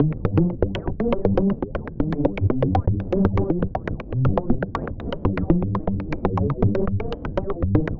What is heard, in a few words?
Jupiter Bass, Bass Foundations; Sonic Foundry (Sony Creative Software); The Electronic Music Manuscript Collection